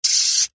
household radiator heater portable timer dial turn set single; zapsplat